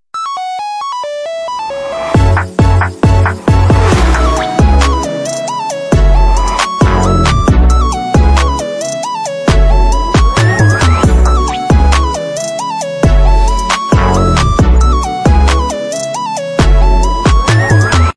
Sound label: Modern ringtone